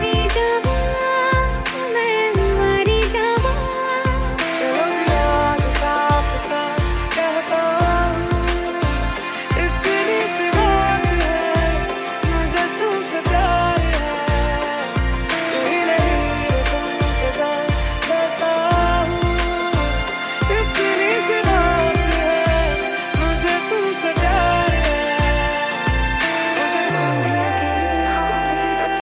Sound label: hindi music ringtone, bollywood